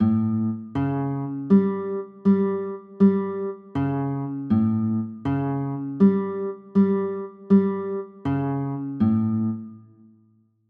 a 6, chords

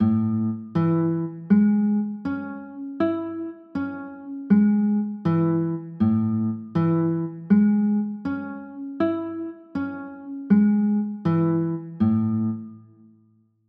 a maj7, chords

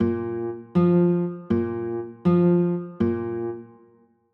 b flat 9, chords

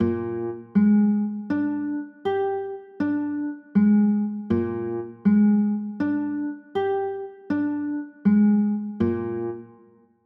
b flat 9, chords

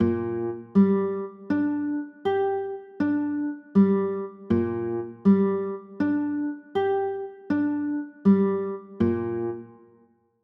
b flat 9, chords

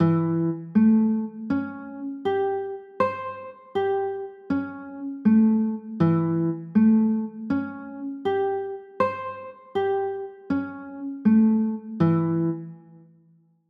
a sharp 6 9, chords

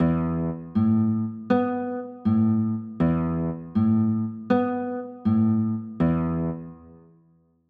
e sus4, chords

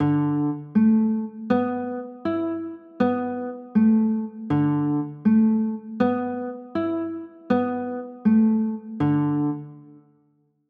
d 6 9

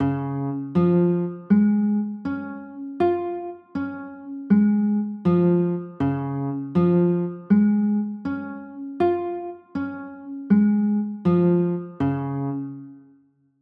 d flat maj, chords

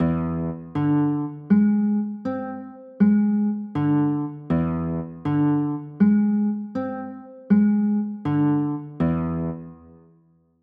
e 7 sharp 9, chords

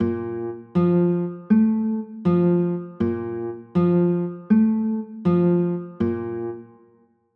b flat maj7

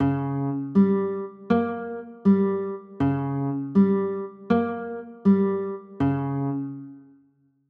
g dim, chords

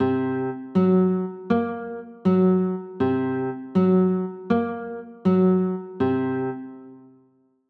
c sharp 6 9, chords

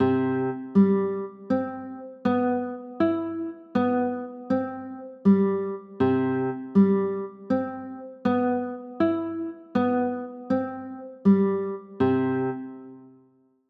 c maj7

